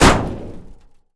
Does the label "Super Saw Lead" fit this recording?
No